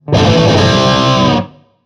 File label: Rock, notifications; Marshall; London KB 1501